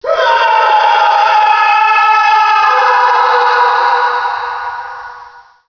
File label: movement; gearbox; gunman